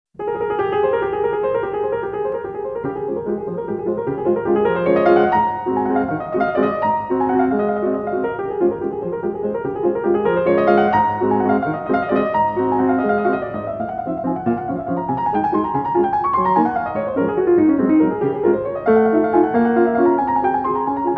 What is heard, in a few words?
Waltz in D flat Minute Waltz